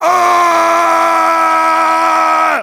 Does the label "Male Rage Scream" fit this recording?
Yes